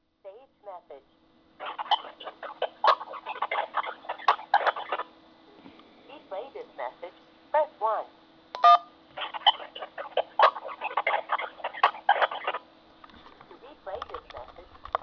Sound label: phone evp